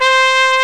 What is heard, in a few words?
WINDS & BRASS